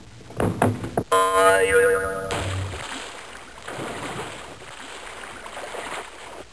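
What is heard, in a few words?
Diving Board, Boing, Splash; Soundsation; Human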